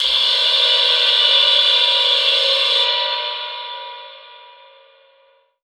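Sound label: alarm siren, reverb processed, 170bpm; musicradar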